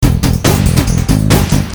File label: depressing rock music